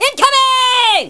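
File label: incoming; SHELL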